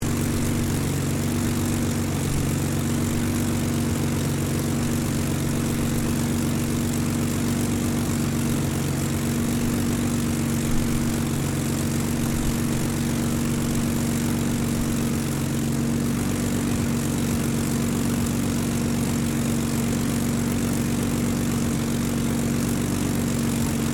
AIRCRAFT SPITFIRE ENGINE SHUTDOWN, Rolls Royce Merlin engine; Airplanes; Vehicles; Airplane Sound Effects